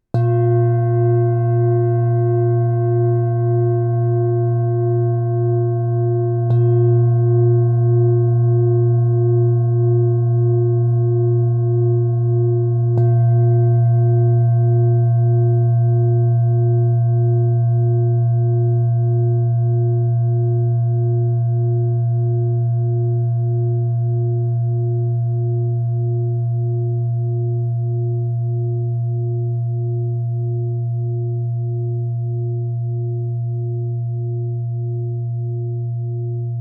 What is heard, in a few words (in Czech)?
cerna tibetska misa